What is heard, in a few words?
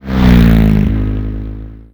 Car Passby Slow